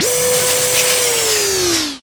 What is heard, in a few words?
Mini Gun Spool; blastmorph pinball; xbox series xs